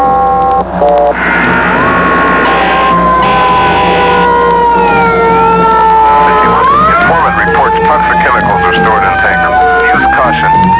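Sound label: emergency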